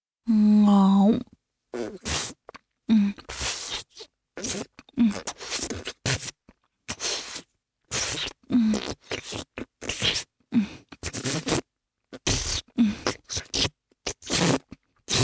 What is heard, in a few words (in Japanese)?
フェラチオ(中):亀頭をしゃぶる, チュパ音; チュパ音抜粋集